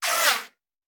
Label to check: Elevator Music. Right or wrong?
Wrong